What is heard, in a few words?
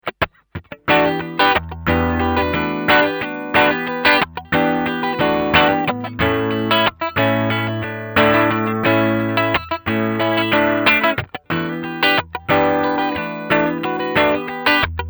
Clean